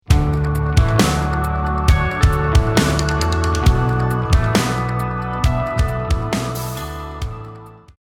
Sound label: lost round, music